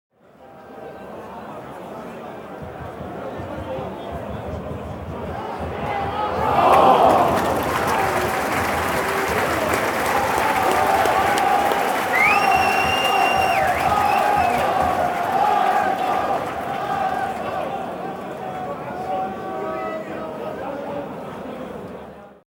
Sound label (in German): Stadion ohhhh